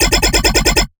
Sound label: Dirty Bass F 140, Bass Hits; One-Shots; Ghosthack - Color Bass Essentials